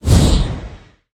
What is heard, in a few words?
roll intense; smite; god geb skin7